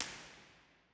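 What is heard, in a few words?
impulse response, Lady Chapel St Albans cathedral; b-format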